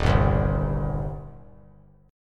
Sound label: Eb 6th Eb down; Eb6 strummed; JGuitar